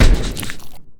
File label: mush shoot; Risk of Rain